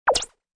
Multimedia, Blip, Blurp, Button, Menu, Rollover, Web Page Or Flash Animation Sound; Beep Sound Effects